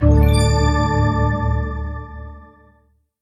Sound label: screen done; pirate mysteries android ios macos mobile windows gamerip 2012